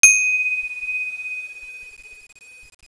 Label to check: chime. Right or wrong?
Right